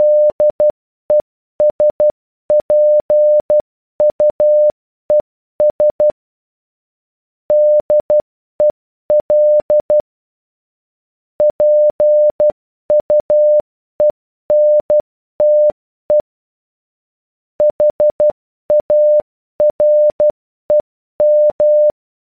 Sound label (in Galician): mensaje morse